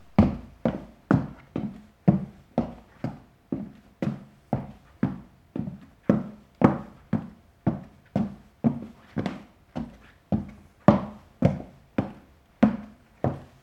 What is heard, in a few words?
Wooden Steps